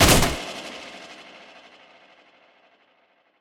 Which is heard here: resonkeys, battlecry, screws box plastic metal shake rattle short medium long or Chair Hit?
Chair Hit